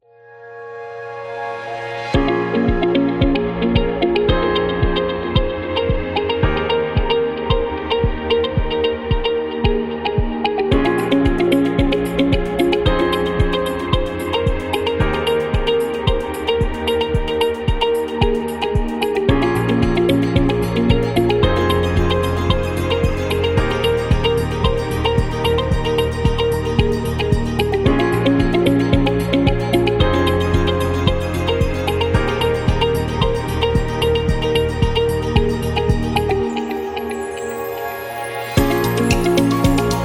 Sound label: Corporate Health